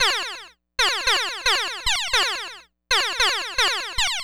lead A

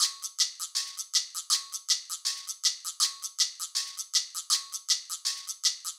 perc loop

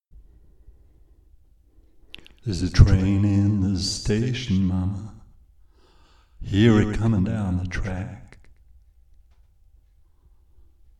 train in the station

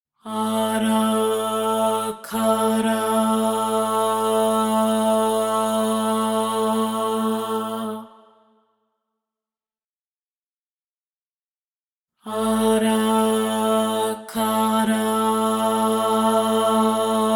432 hz; MixterPlus

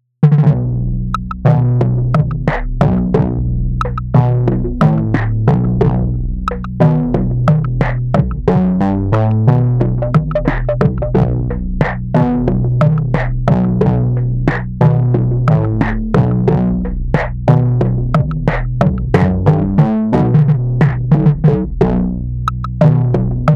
90 Rhythm Section fx

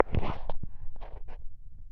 contact perc

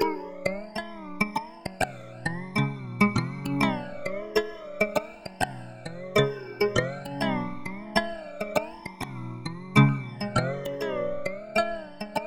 Ukulele

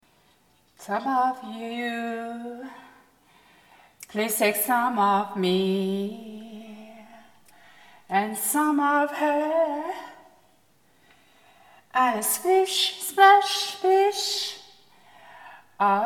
Emergence Sea with MMT (Clean recordings)

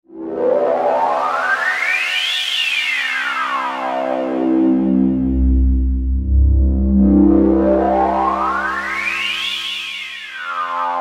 Bassy Whoosh Synth

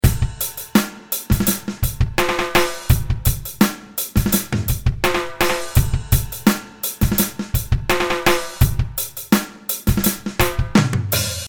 beat

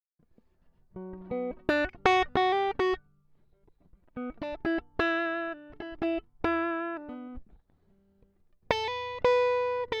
Retro Groove

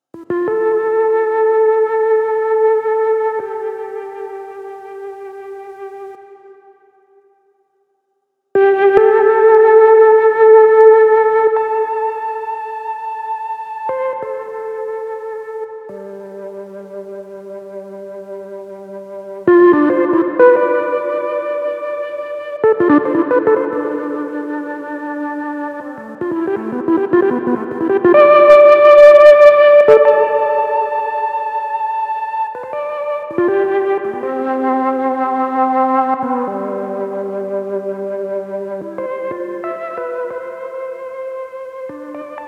Gm90 Brocéliande Mellotron Solo

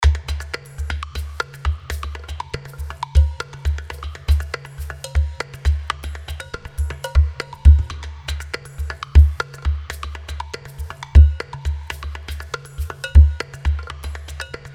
Euclid Urdu percussion

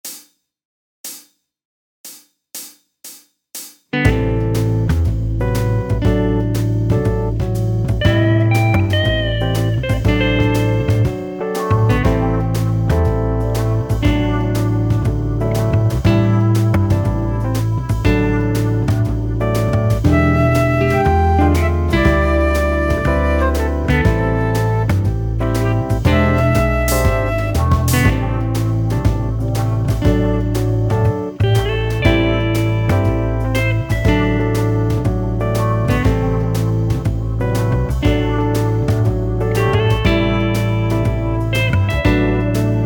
Blues Jazz A minor 120BPM

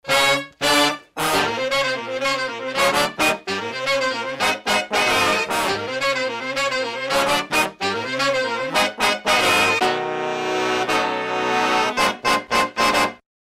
brass loop

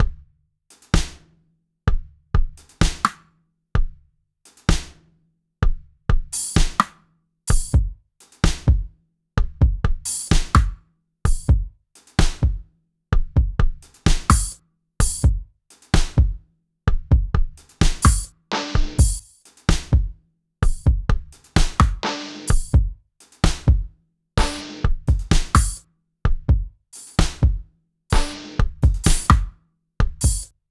game Drums

